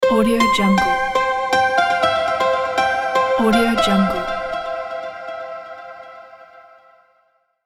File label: corporate pluck major